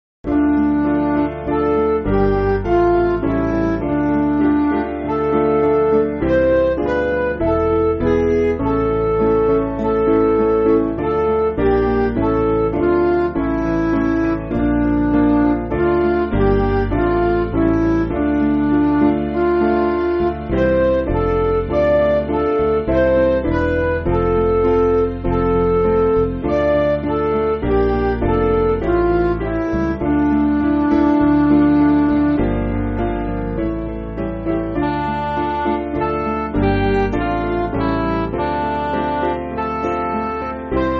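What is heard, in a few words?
Music Backing Files for Churches; Thou Lord Has Power; Dolgetty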